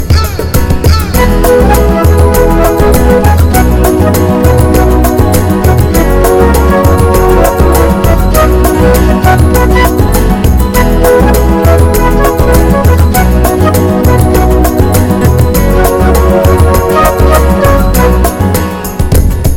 Great Dancing Song

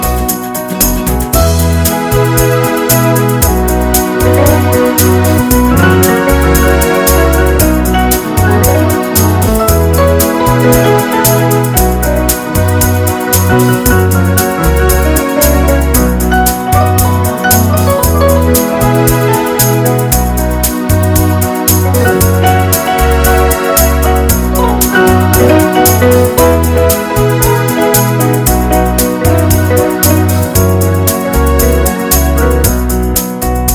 A Happy Analog Ballad

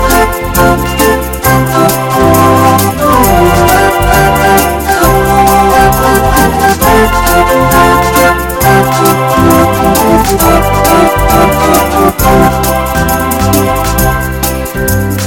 A Pretty Swing

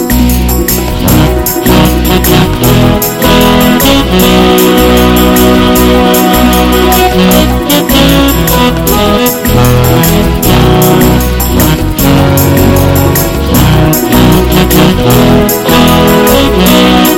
A Fast Pop Rock

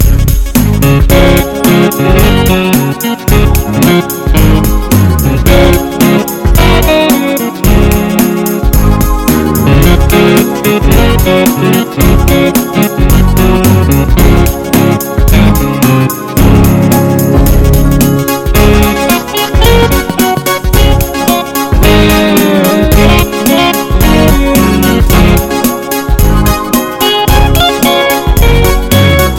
Pop Music

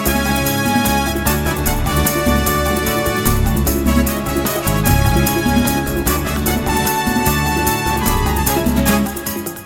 Super Orchestra Sound

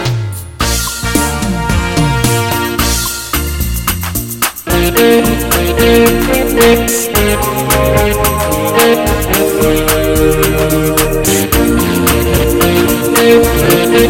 A Hot Latin Song

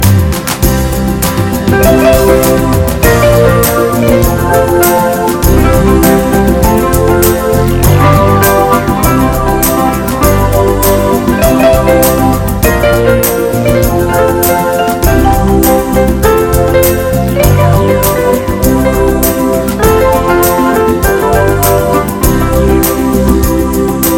An Exciting Ballad

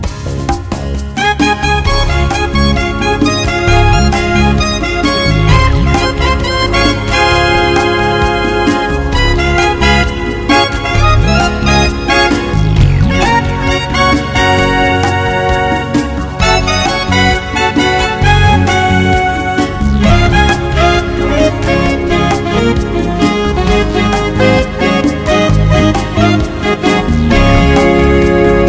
Happy Guitar Music

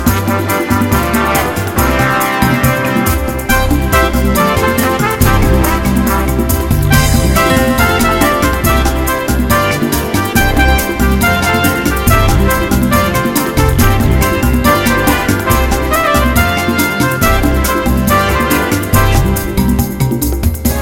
Happy Fast Song

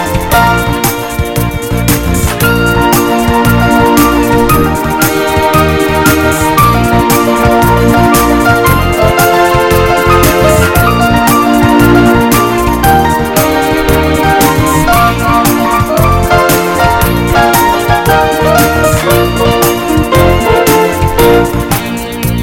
I Love Pop Music

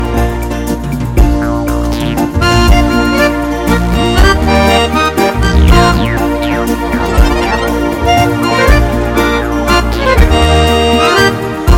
A Beautiful Ballad

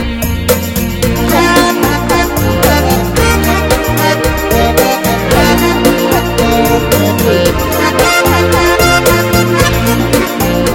Beautiful Latin Rock

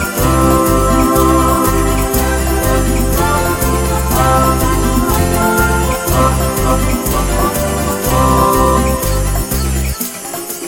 Pretty and Hot Samba

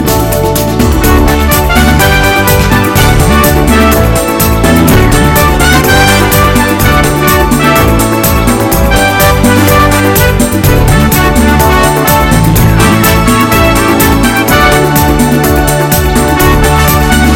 Disco Remix

